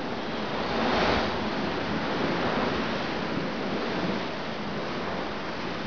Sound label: windy